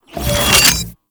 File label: Bayonet Reload; Warframe; Tenno